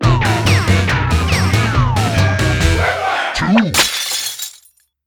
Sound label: Title Music; Quiplash 2; Jackbox Games; The Jackbox Party Pack 3